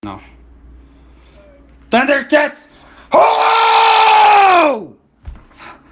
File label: roar; thundercats